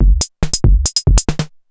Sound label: Analog Funk, Drum Loops